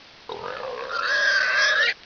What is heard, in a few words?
houndeye idle; svencoop